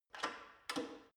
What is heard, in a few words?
gym bicycle saddle adjust lock soft, fitnessstudio